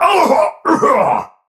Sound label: Pain Heavy; Tau; Dax; Battle Barks